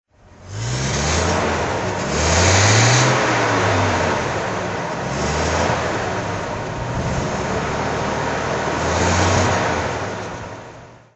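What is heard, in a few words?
lagonda lg6 throttle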